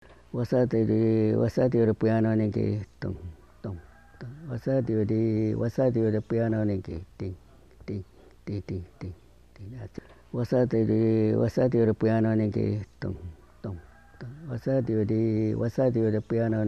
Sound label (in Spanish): Canto del hombre de la selva